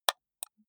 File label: computer mouse left click single slow hard